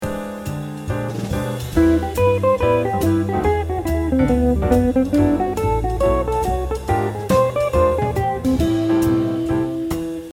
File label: Lick